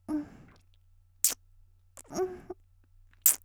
「キス」2回声付き; 綾波系少女

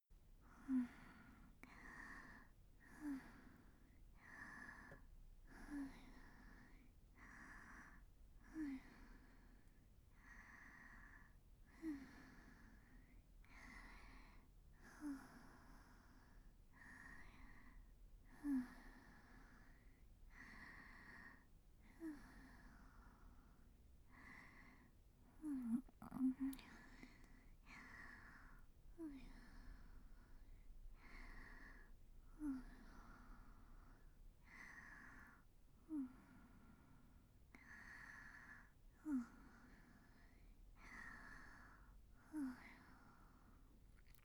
寝息のみ

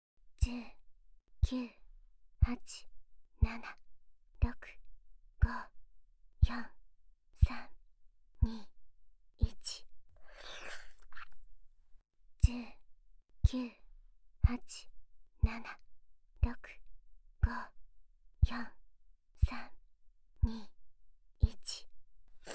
まずは優しいマッサージから…定期的に訪れる柔らかなキスの感触をお楽しみ下さい; ピリオディック・セックス・イン・リゾート; ループ

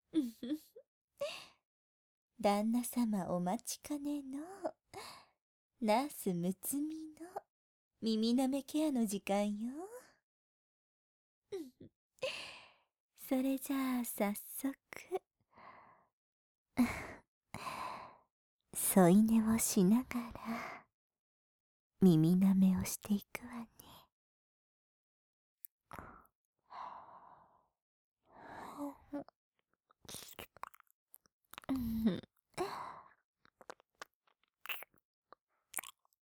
添い寝耳舐め; 癒しのW添い寝耳舐め（ナース）

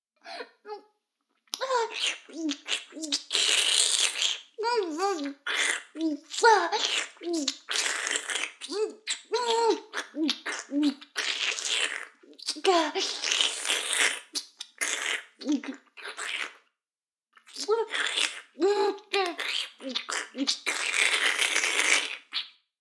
フェラ音声; 逆時間停止されて

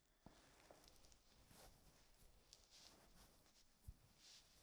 布ズレ

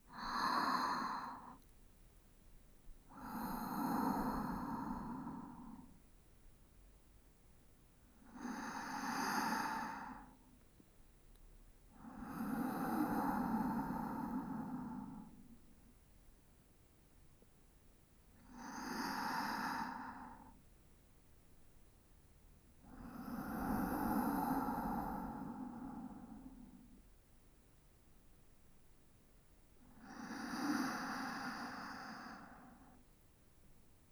寝息（左側）, ASMR

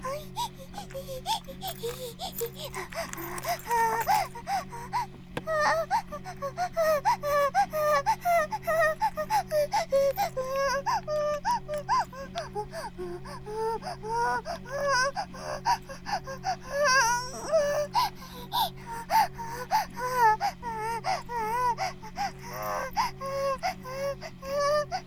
バイブ放置; イクたびにわけて

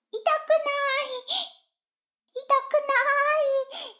シコシコ音声; 逆時間停止されて

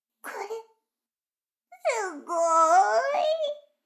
耳舐め音声; 逆時間停止されて

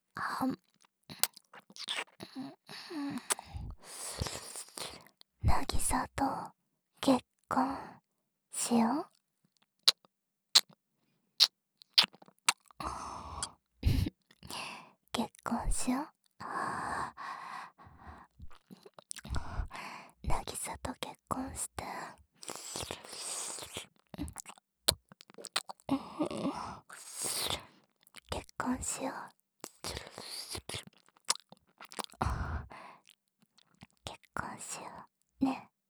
耳舐め

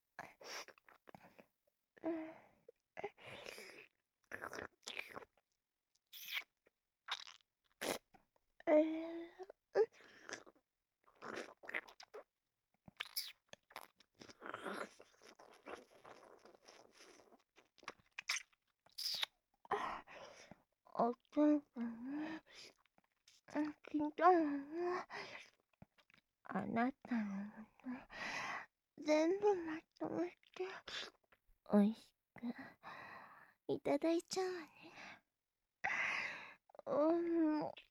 フェラチオ; 立体音響版

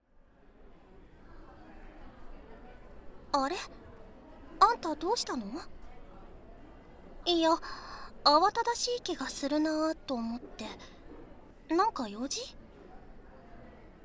両耳舐め, ASMR; クラスメイト

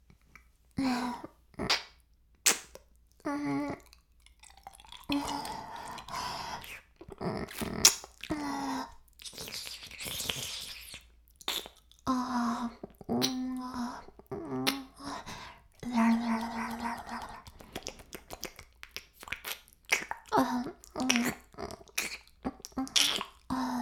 耳舐め音声, ASMR; 白くて黒い魔性円環

